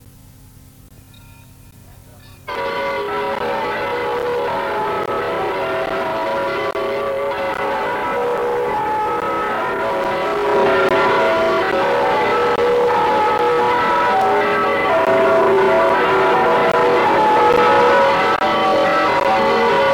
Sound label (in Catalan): campana